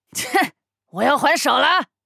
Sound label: hurt; 哪吒艾德加（荒野乱斗）; 新春大乱斗